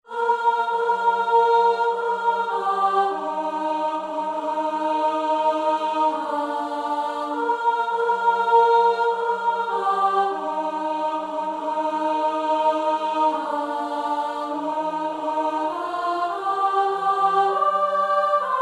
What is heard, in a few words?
Alto